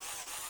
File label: inserter fast; factorio; Factorio 0.14.21